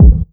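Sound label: 127 drum 2 R, Fresh Disco House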